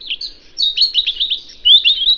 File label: Birds Chirp; Playable Tutorial Level; TR2